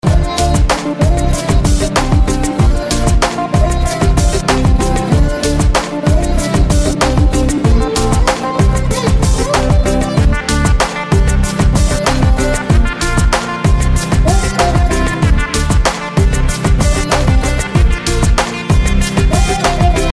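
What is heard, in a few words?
Sitar Instrumental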